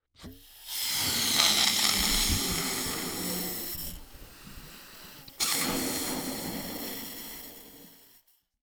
Cartoon Balloon Sounds Balloon Being Blown Up Blowing Twice; AdobeCC